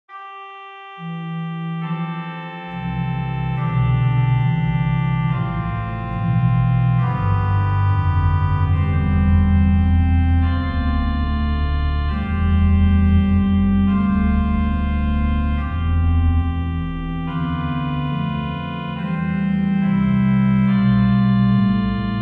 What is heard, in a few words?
Organ; Dupre, Marcel; 46. Come, God, Creator, Holy Ghost; HW: Alan's CC Organ